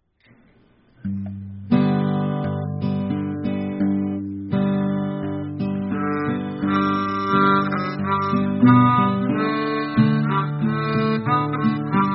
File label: guitar Pulmonica